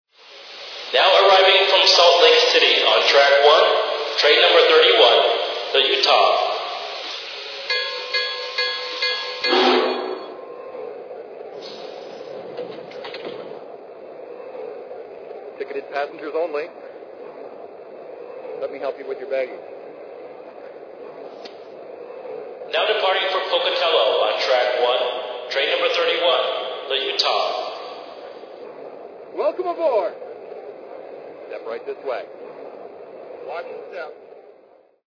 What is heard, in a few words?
passenger; 844